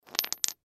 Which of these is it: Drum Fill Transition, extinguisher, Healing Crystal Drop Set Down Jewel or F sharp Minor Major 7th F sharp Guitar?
Healing Crystal Drop Set Down Jewel